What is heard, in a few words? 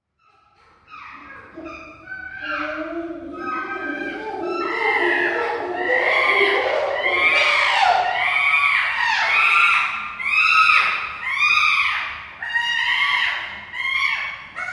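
shimpanze golos, chimpanzee voice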